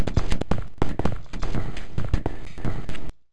all steps; aompa

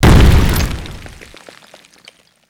grenade explode; cstrike; mw2

